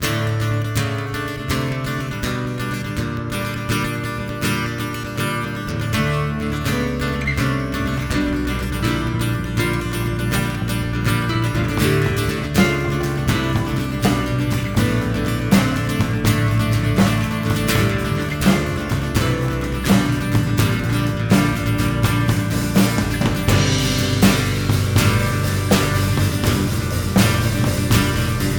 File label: Thunderstorm Jam